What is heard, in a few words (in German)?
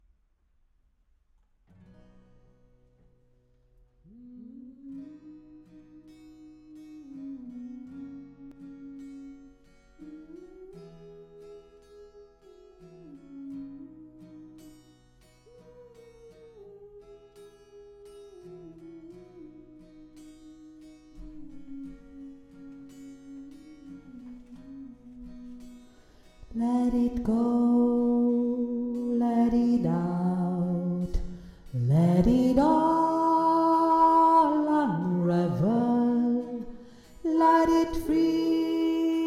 tiefe stimme